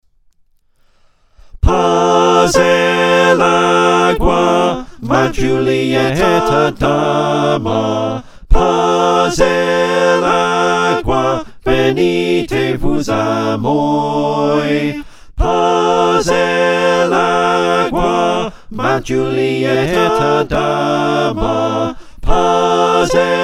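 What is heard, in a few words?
soprano alto tenor bass, choir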